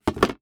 Household Bin Set Down Plastic

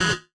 Multimedia Internet CD-Rom Flash Buttons Short

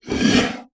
Animal Mammal Herbivore Donkey Mule Snort; animals; AdobeCC

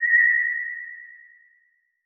Title Transition Ghostly; Production Element

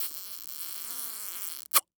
Cartoon Human Male Long Squeaky Kiss

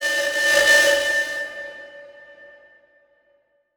Title Transition Feedback Pulse; Adobe; Production Element

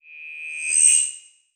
Multimedia Internet CD-Rom Flash Electronic

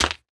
Impact Wood Whip

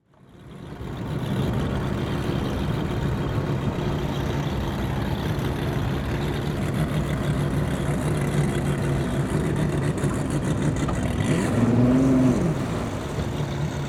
Transportation Boat Idle

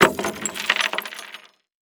Crash Glass Crash Dummy Hitting Dashboard; crashes; AdobeCC

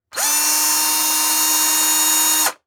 Industry Tool Run Electric Drill Low Speed

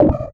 Multimedia Internet CD-Rom Flash Pop Up

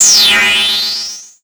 Multimedia Internet CD-Rom Flash Beep Mechanical

